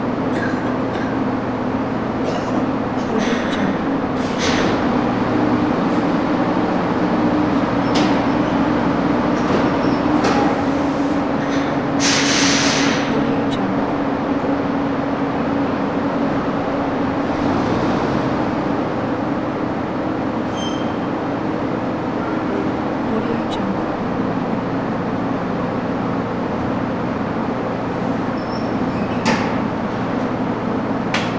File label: bus station